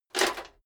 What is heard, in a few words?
metal plate armor movement single